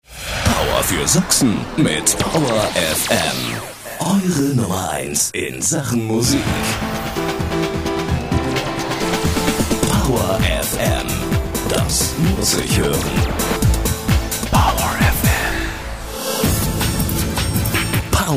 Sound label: jingle mix